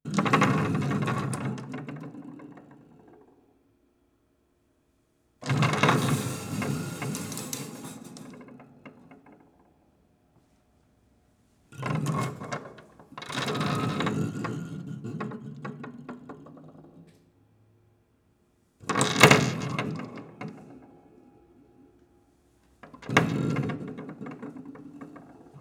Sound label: Marble Rattle, Piano Destruction